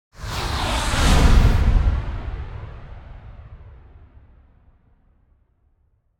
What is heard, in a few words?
Pyroclastic Flow Short Flangy Transition